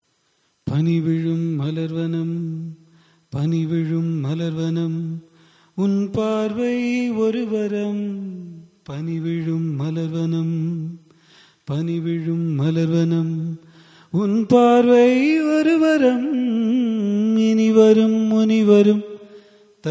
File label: raga chala nattai, carnatic music